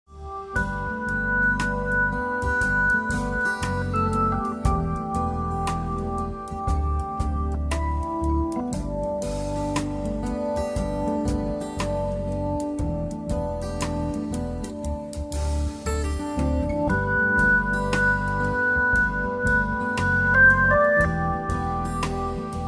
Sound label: organ trio